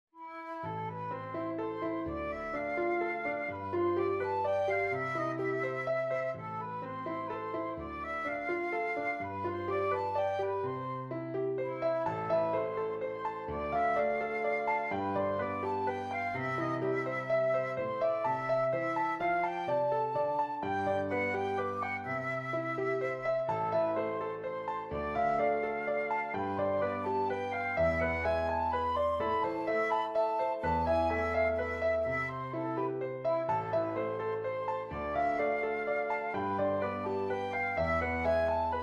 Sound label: Waltz in A Minor